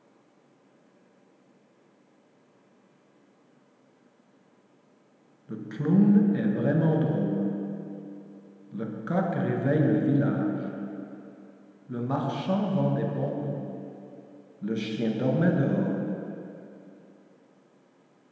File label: Microphones HP100 300deg